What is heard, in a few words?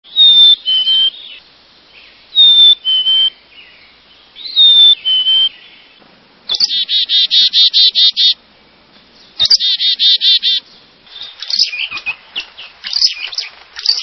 Black capped Chickadee